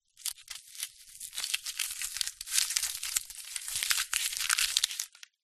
household band aid plaster strip long loose movements scrunch